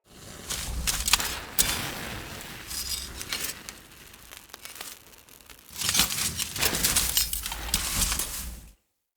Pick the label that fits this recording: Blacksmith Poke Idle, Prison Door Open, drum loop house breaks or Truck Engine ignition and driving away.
Blacksmith Poke Idle